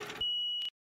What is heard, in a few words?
Money Cash; Consolaria